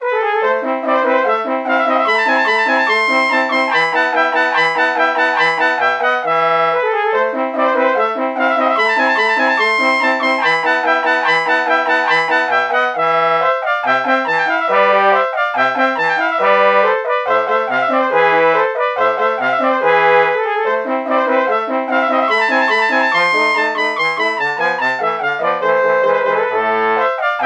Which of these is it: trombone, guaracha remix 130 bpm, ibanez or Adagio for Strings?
trombone